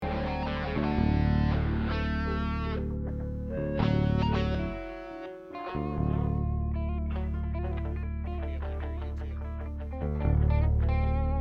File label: Strings Mix